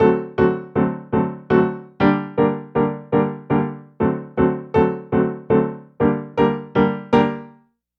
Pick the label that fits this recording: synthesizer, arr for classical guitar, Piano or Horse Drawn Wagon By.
Piano